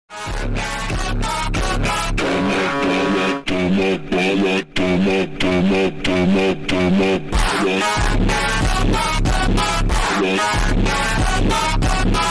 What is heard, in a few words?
viral phonk